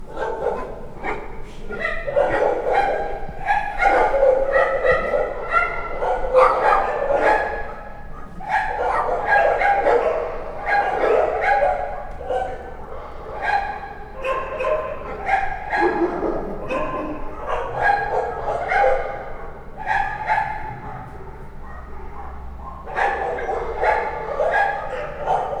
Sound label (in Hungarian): kutyakoncert ejszaka